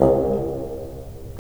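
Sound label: WND BN, wind bassoon; LA Composer vol. 3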